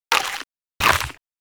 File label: Attack; Mars Sortie